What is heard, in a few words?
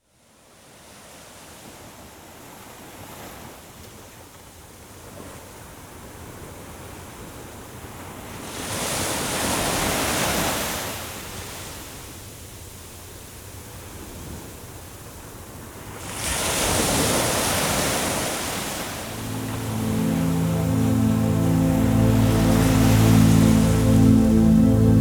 Relaxation Music of Acceptance and Ocean; Inner Harmony